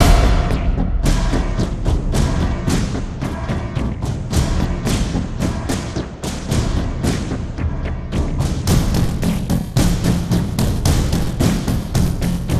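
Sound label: music, synth percussion loop long; transformers war for cybertron gamerip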